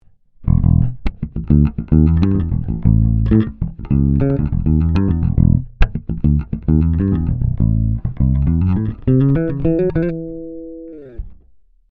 Ibanez EHB1505 Neck Bridge Passive

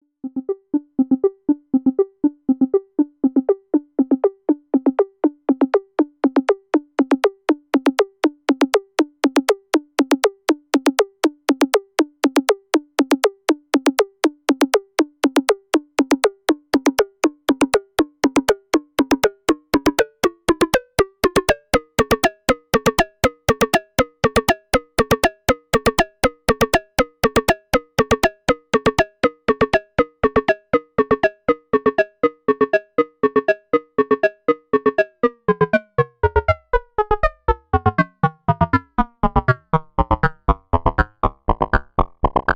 Model 15 FM

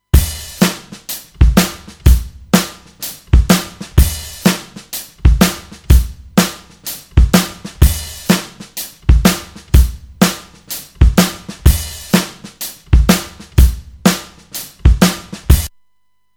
Acoustic Drums Original

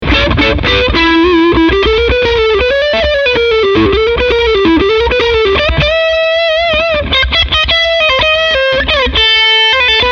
High Gain Lead

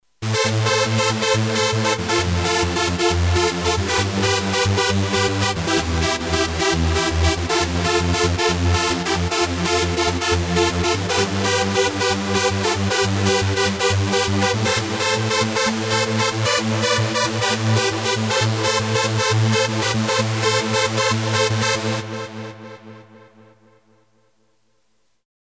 Trance Lead Super Saw

